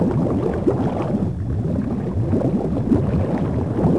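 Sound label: undrwatr, underwater; The Elder Scrolls III Morrowind GOTY; XBOX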